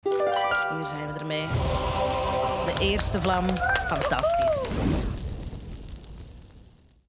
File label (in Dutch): eerste vlam